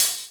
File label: Open Hhat, Hihat; Equipped Music; Loungin House